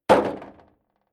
Impact Light Thin Hollow Metal On Ground Single Hit Rattle Stinger